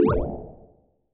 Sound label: Retro Blop Stereo UP